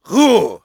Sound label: throw; UFC Tapout; XBOX